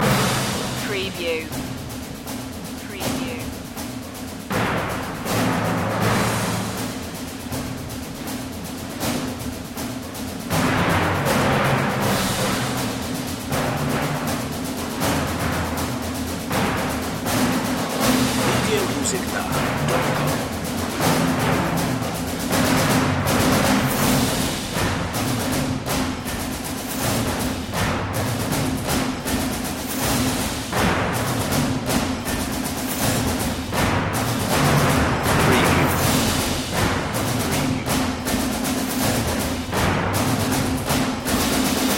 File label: percussion only